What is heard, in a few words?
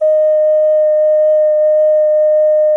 SOP D#1, GEMSHORN; SoundBytes - Early Patches